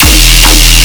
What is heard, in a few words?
Bass Hit E, Dubstep